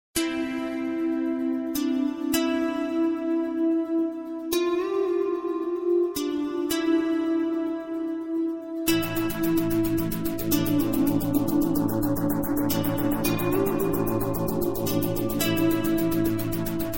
great guitar ringtone

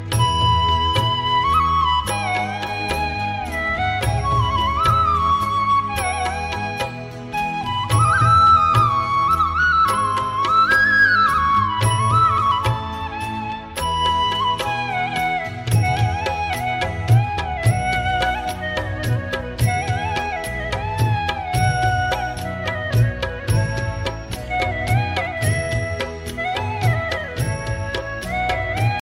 tabla flute ringtone; bollywood

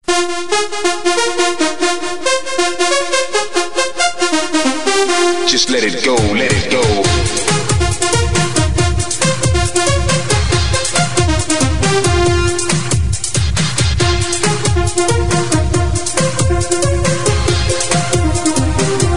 lovely android mobile ringtone

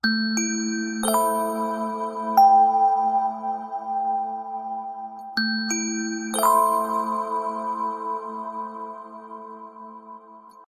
oppo reno 7z 5g sms ringtone

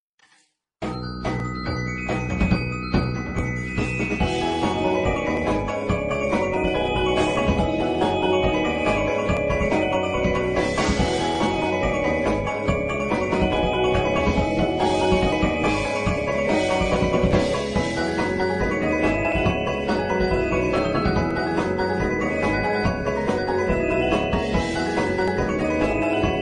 arranged for percussion

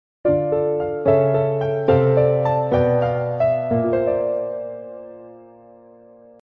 sad melody ringtone

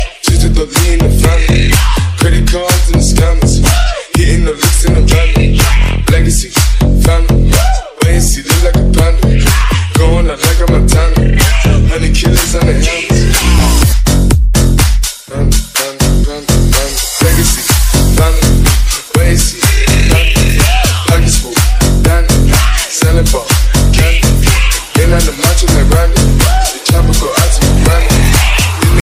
cool sad remix music ringtone

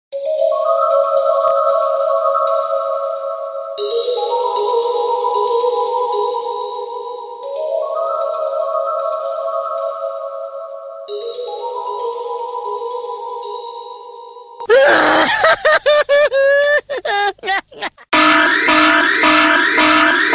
alarm tone ringtone

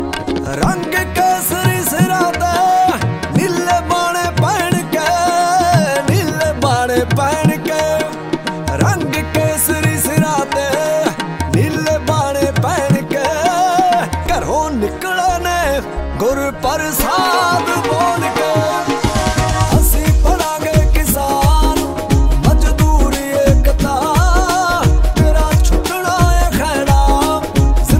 punjabi ringtone

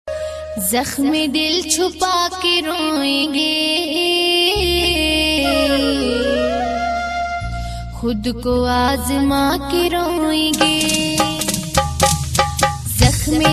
paki tone ringtone

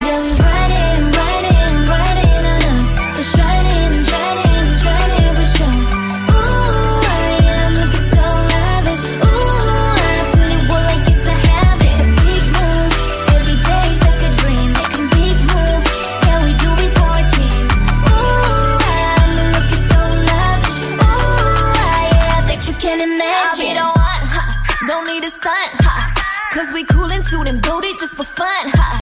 english song tone ringtone

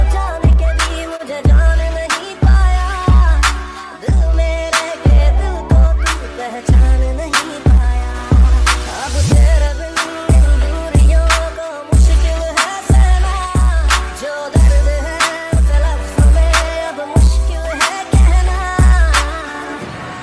bollywood ringtone

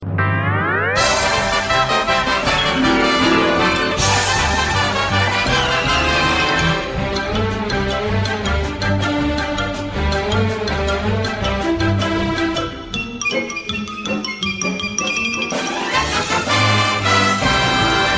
cartoon music tone

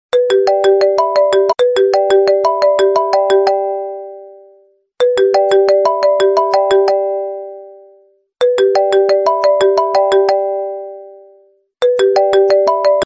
apple i phone 4g ringtone

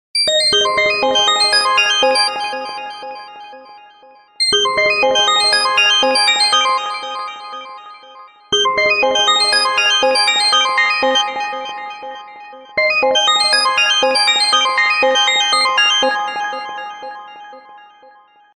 note 3 ringtone

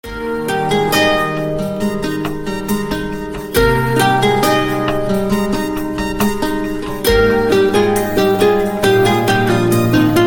huawei original ringtone

